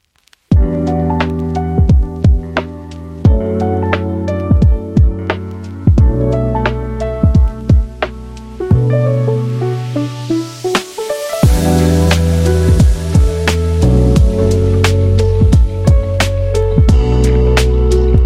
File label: Lofi Chill